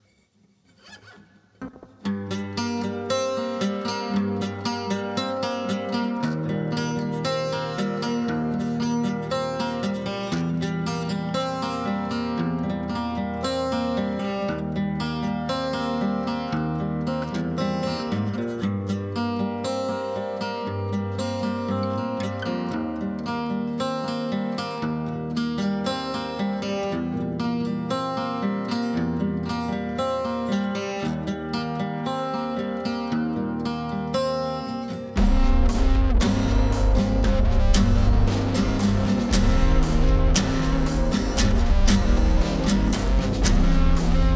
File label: Acoustic